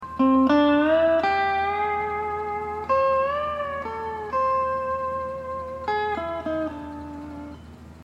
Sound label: hawaiian piece